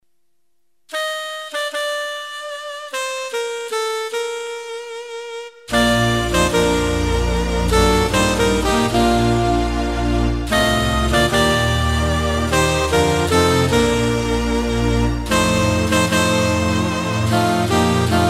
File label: instrumental